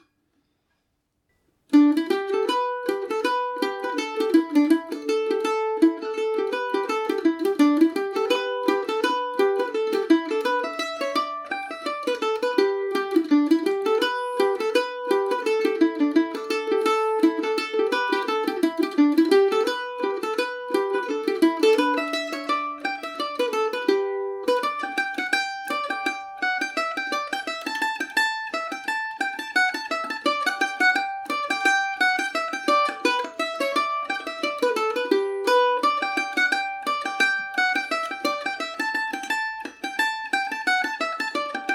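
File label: mullins favourite g major, mandolin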